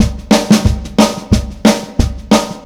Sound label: DRUM, COUNTRY